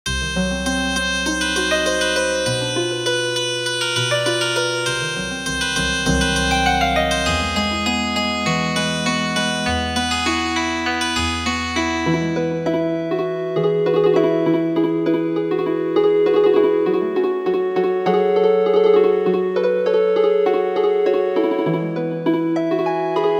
Healin Harp

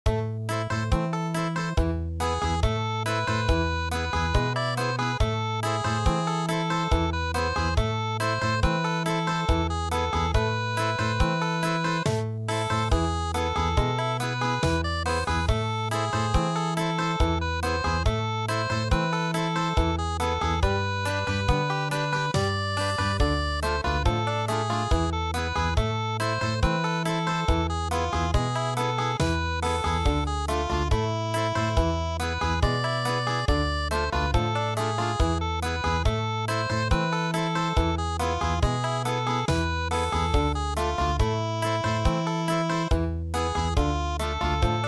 Country, midi

